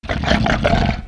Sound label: moan; The Elder Scrolls III - Morrowind